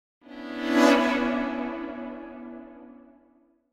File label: Symphonic Hit Short Show Up Chords; Hollywood Edge; sound designer's toolkit v2